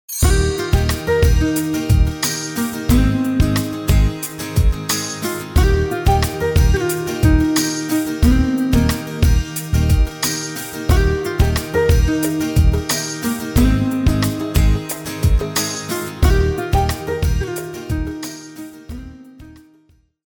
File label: music sample contemporary